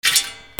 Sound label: GARAGE